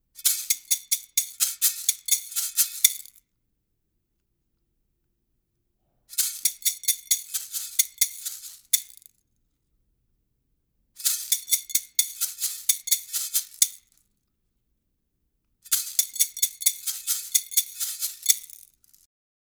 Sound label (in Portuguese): CHOCALHOS grande e pequeno